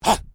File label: MALE VOCALIZATION HAA; Exclamations; Voice